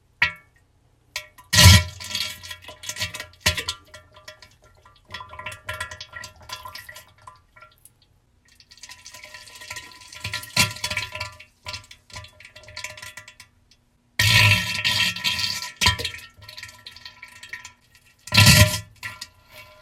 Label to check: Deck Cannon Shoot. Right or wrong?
Wrong